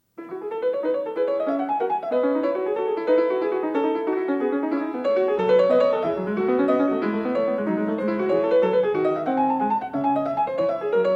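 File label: Sinfonia in E, piano; BACH; Sinfonia 6; BWV 792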